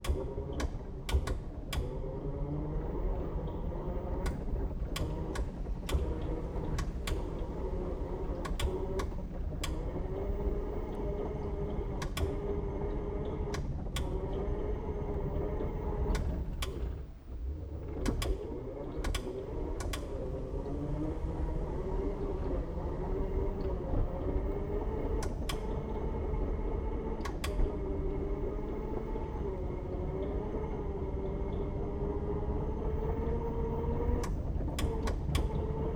Bumper Car ONBOARD MEDIUM Drive Various ONBOARD Rear; Pole Position Production; Bumper Cars; VP88